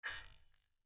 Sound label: GUI Sharp Activate; The 39 Steps